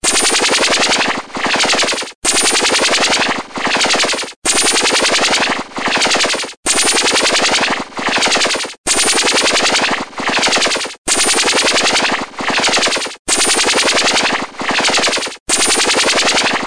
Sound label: syn syn